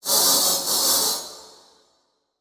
Title Transition Distortion; Production Element Title Transition

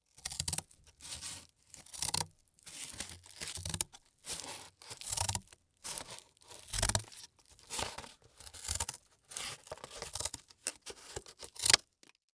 Industry Tool Scissors Cut Cardboard

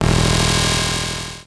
Transition Feedback Distorted; Adobe; Production Element

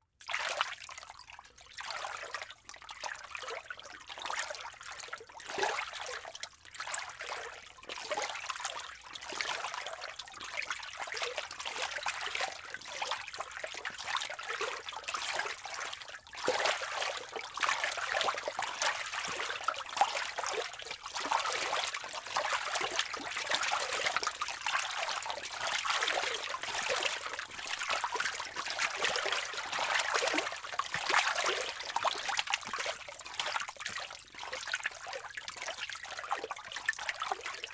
Ambience Water Hands Splashing Slow; AdobeCC

